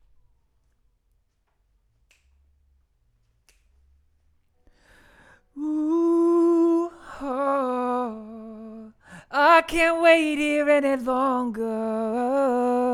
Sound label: Vocal